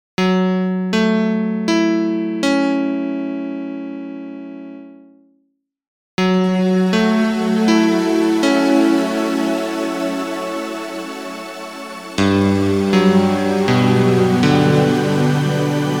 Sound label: Electroacoustic Piano; Luminance